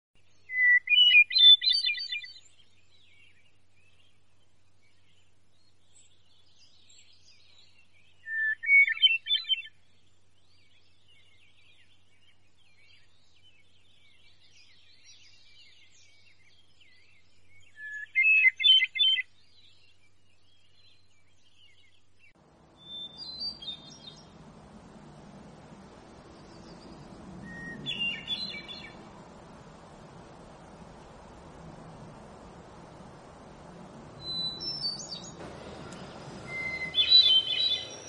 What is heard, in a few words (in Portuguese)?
canto do assum preto